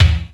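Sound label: ROOM BD, bass drum; R50III; Crypton - DrumBase5000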